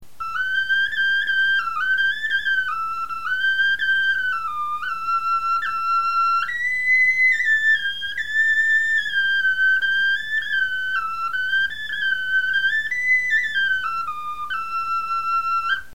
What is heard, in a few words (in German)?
melodie, knochenfloeten